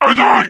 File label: charger lurk, voice idle; source engine; left 4 dead 2